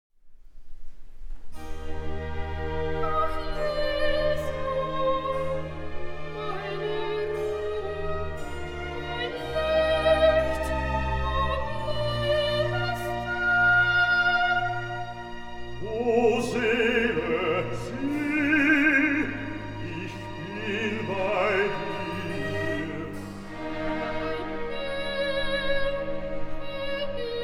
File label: Recitativo